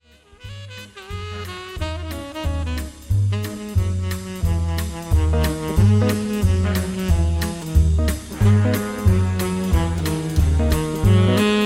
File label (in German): Tenor Saxophon